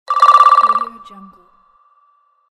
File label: interface notification